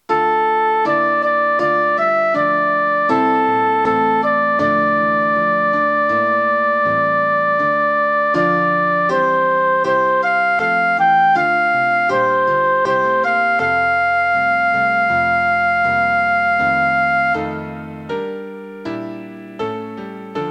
Flute

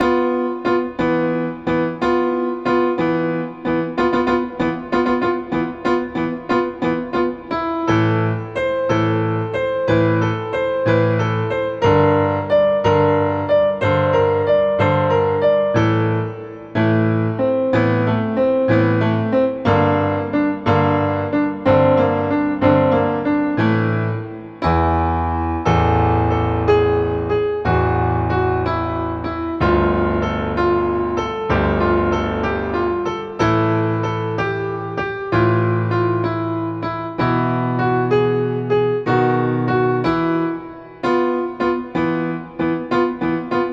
Skeleton Violin

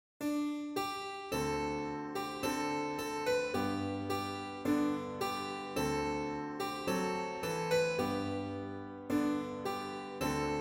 Instr

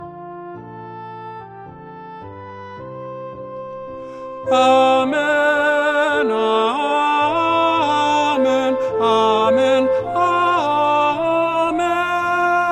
Community Descant

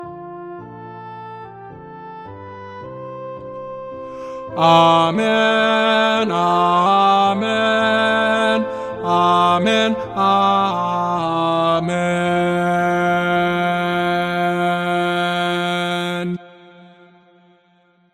Community Alto

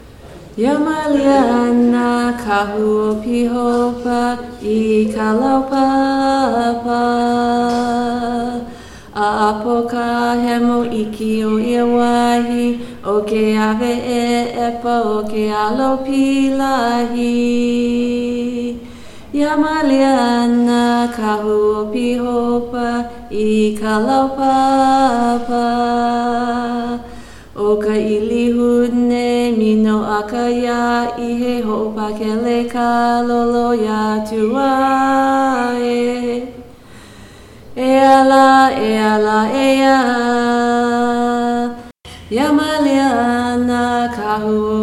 Chant

